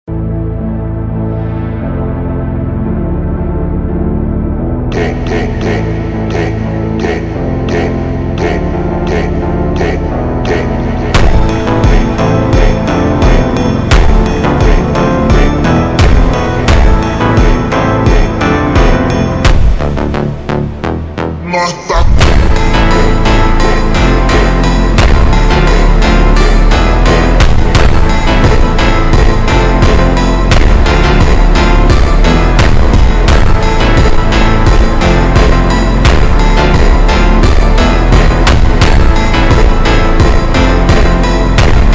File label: ultra slowed reverb